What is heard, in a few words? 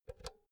power cord insert soft; objekte; elektronik